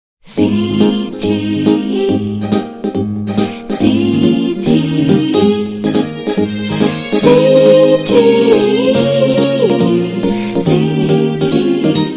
Singing RingTone; ZTE Grand-S-2; ringtones

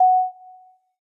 panorama start, Camera; Samsung Galaxy S10; SamsungCamera

